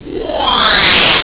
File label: Whoosh; Deliantra